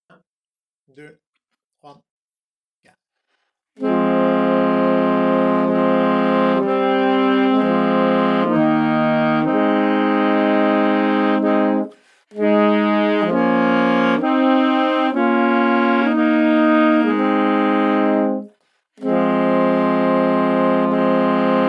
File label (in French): Pavane accompagnement; orchestre a la maison